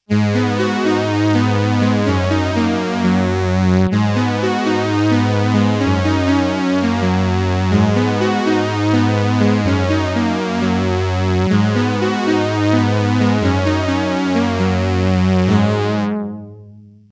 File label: Casio CZ101 strings; Casio CZ-101 is a digital synthesizer using phase distortion synthesis